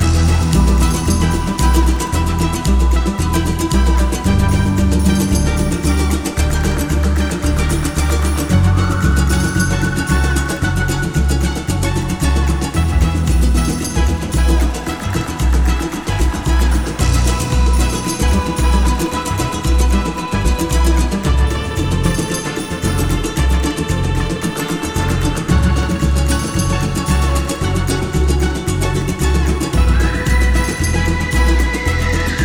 Music Fight

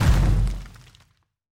Rock Wall Hit; Munin

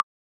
Click

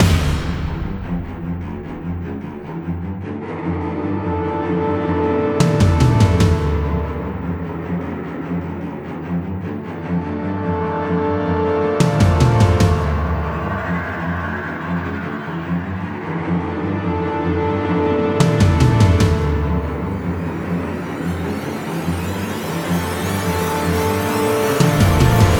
Action Trailer Music Cue; Samael theme; Fallen Knight

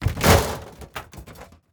plastic break; teardown ps5 windows xbox series xs gamerip 2022

